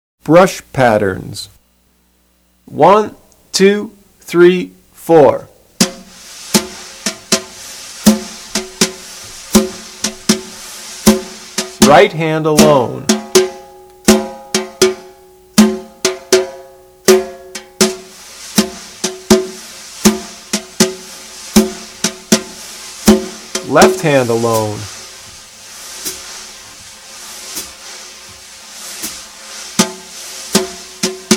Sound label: brush pattern basic pattern